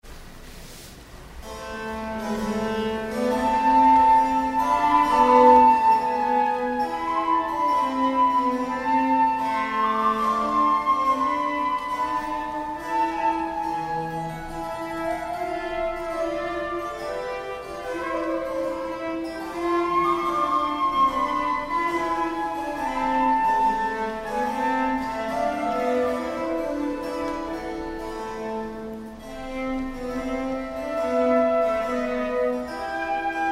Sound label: Sonate Largo; Barsanti